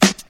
Rap Acoustic Snare G Key, acoustic snare sample tuned to the G note; snare drum samples